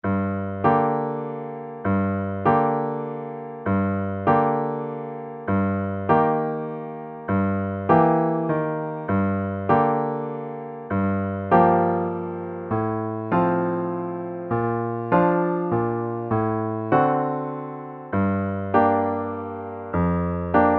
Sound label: House of the Rising Sun Piano